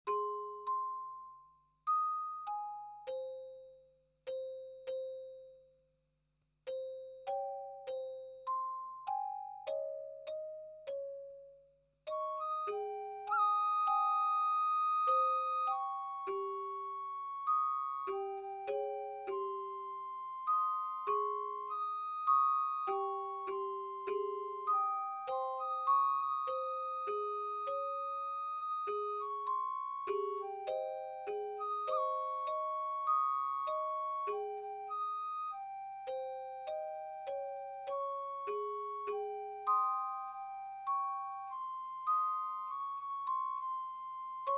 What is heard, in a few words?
Java Gamelan